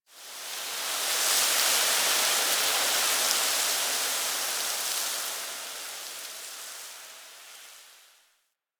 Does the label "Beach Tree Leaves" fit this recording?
Yes